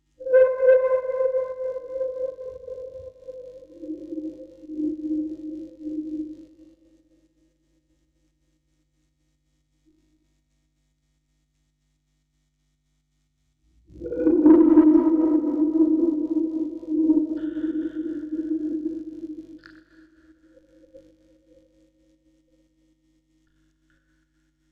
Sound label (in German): Aufnahme Vocoder, Spieluhren-Quartett; Tonband; Fieldrecording